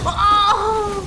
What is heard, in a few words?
moan